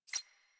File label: UI Lock On; Player Ship; Warframe; Railjack